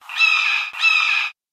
monster frozen hawk

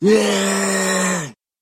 monster cursed guard trainee

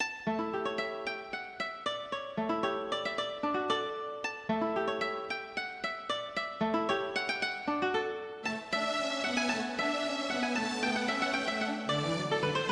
town upbeat, music

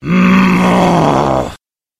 monster barbarian xbowman

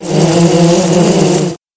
monster spore swarm

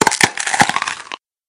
monster skeleton

